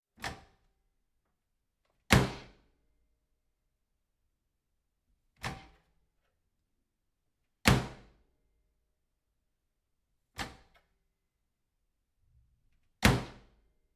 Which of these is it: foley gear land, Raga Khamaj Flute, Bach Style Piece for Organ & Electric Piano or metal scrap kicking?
metal scrap kicking